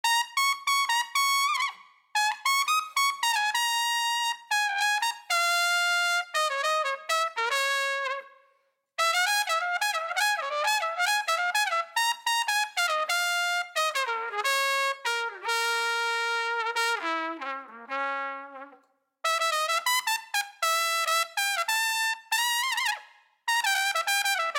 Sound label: Trumpet; the lego movie 2 videogame macos ps4 switch windows xbox one gamerip 2019